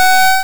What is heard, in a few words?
Beep; fungus